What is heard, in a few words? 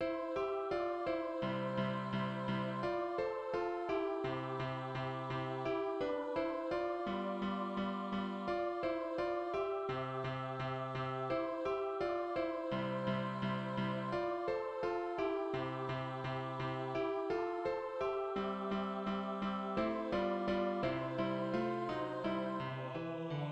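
tenor, Practice Music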